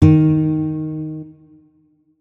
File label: notes, guitar; Hideout Furniture